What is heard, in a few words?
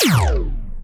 Laser Shot Big